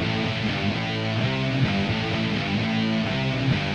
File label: gtr riff